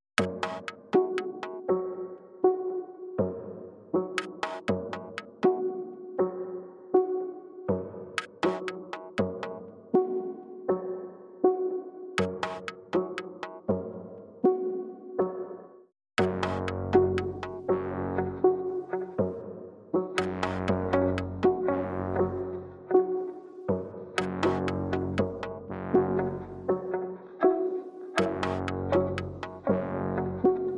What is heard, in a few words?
Obvious Conclusions (Neutral), No Lead